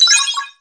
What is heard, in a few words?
crossbow zoom; zmall; weapons